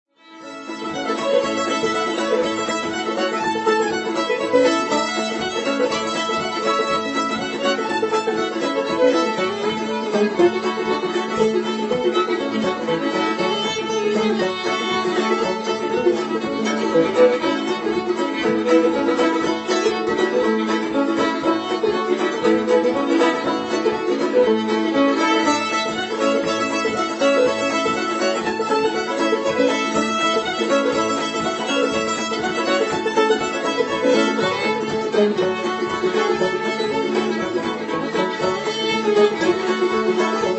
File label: Fiddle, Banjo, Uke, Guitar, Mandolin; Chinquapin Hunting; cafe&bar&gallely "Ichiziku" OSAKA JAPAN; Old-Time JAM